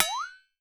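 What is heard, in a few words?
LARGE FLEX-, FLEX-A-TONE; Voice Crystal - Steve Reid Definitive Percussion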